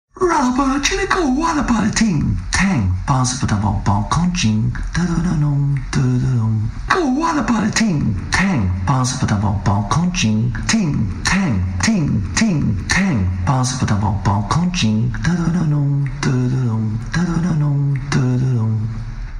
notification

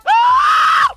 ahhhh; matoro

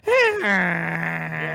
mac rubber chicken

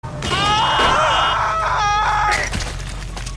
screaming

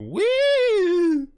mating call

